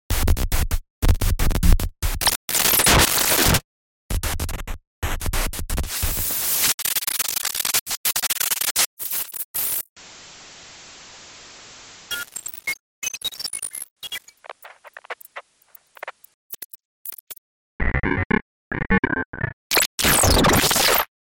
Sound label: Glitch; sound effects pack glitch